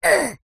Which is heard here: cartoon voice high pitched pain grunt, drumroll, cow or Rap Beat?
cartoon voice high pitched pain grunt